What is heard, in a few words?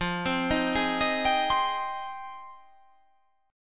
F Minor 9th F